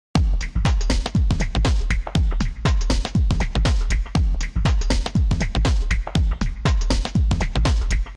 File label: drum loop